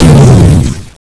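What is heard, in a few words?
pickup; ar2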